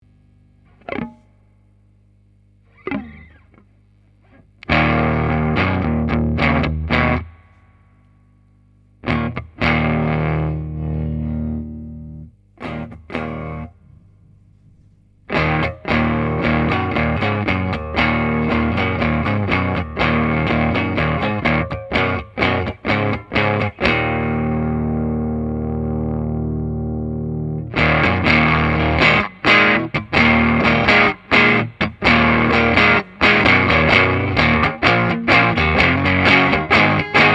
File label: blues; devi ever usa